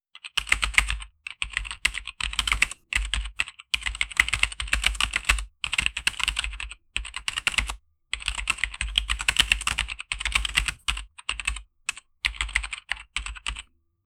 a smooth creamy keyboard

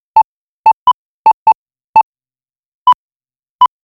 crisp touchscreen tap wit; ui sounds

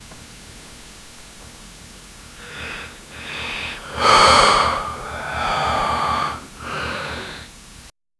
sound of waking up and, bed

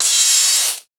crash, furniture

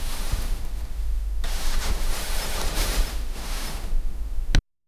clothes hanging on hanger

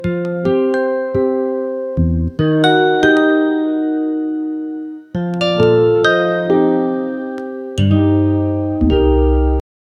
light cozy café style bgm

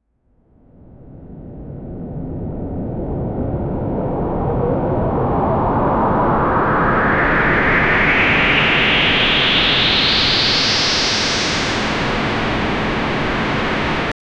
sound of rising storm at, ocean

